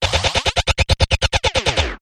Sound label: Rapid Fire Noise Zap